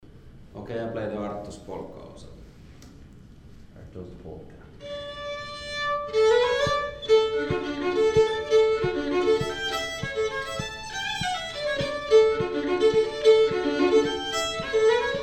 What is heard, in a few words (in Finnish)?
polkka; Arttun polkka